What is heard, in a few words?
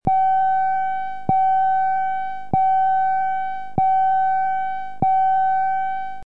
784Hz Tone; signals